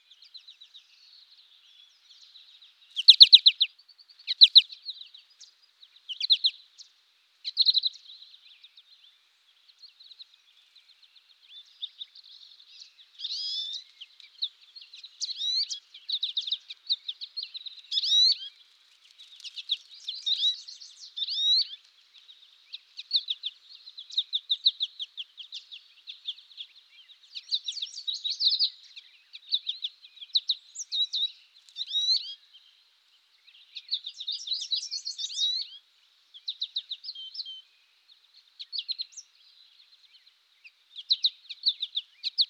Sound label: Goldfinch American; the dazzling american goldfinch; a splash of sunshine in your backyard